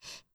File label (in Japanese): ブレス ショート